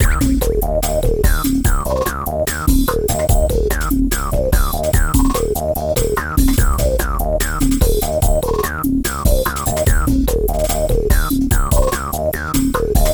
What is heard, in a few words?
bass, grooves